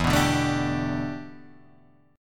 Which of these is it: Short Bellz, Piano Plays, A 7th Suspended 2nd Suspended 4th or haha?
A 7th Suspended 2nd Suspended 4th